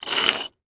Foley Stone Scrape Single

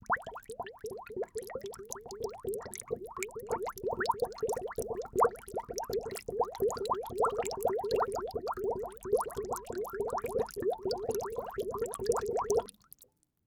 Liquid Water Bubbling Long Slow

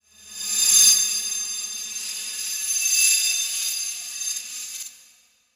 Title Transition Metallic; Production Element

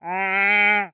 Animal Toad Annoyed Moan; animals; AdobeCC

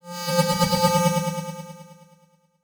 Transition Delay Medium

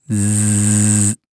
Cartoon Weird Noise Short Cartoon Bee Low; AdobeCC

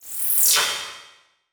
Transition Whoosh Alarm

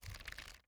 Household Book Soft Cover Pages Flipping

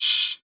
Multimedia Internet CD-Rom Flash Wipe Short

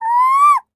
Animal Primate Monkey Ringtail Monkey Call; animals; AdobeCC

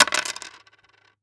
Coins Dropping On Wood Table; household

